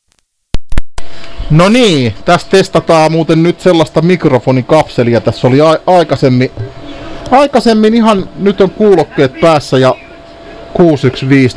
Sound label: nokia 6150